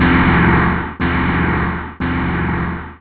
damage

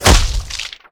gib splt

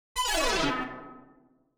escape; shortbow

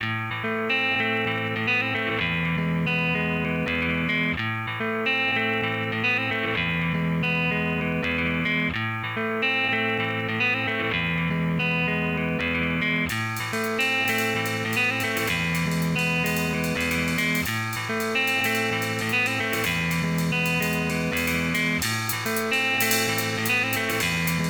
jazzy

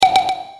health

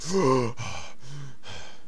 gasp

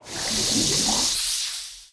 lava short

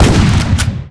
shotgun; weapons

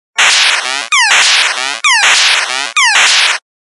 8bit, Ring Tones

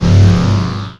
wearoff

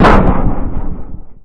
button zap

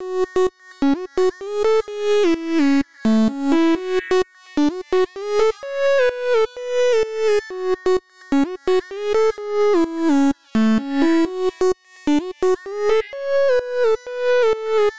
lead

